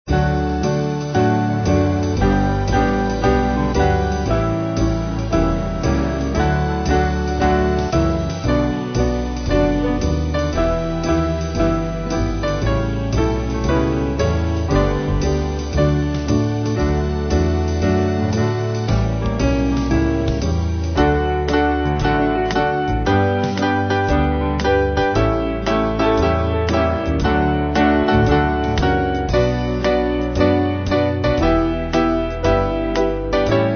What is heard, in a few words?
Band